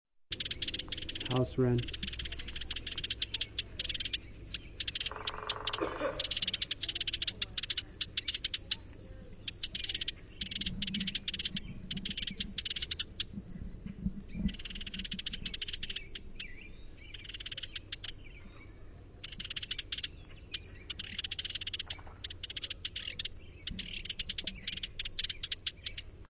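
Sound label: House Wren call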